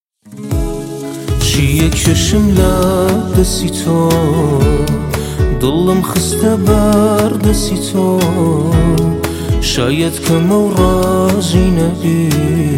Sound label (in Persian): آهنگ کردی